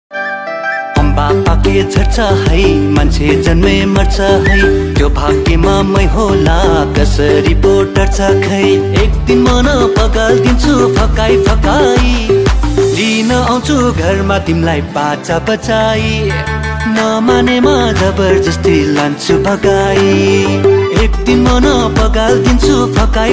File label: nepali ringtone